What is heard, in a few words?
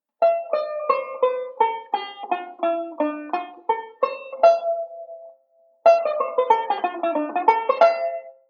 Modal Sequence; Beyond Chord Melody Volume II